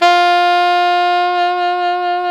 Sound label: SAX ALTO MF